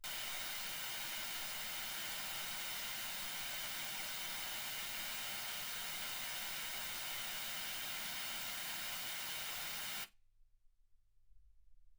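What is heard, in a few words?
white gaussian noise 48kHz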